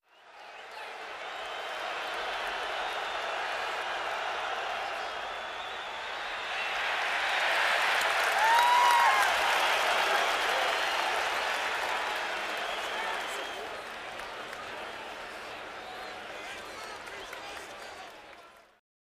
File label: Football Crowd Idle, sports arena football